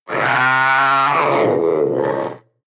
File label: panther young roar